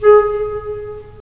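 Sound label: wind clarinet; LA Composer vol. 3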